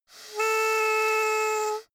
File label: hoot soft